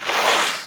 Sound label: Ski Turn On Ice; Misc Sports